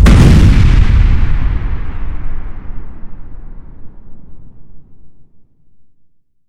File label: explosion Far